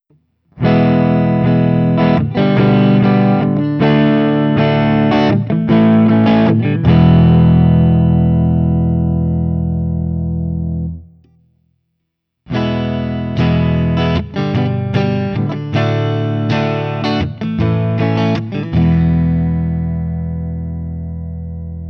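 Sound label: Open, guitar; Guild CR01 ODS100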